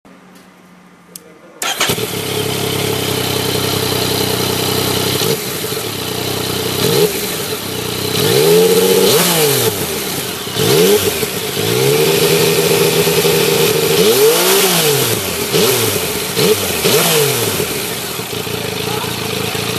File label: Yamaha MT-09